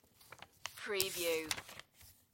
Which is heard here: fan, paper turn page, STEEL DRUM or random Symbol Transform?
paper turn page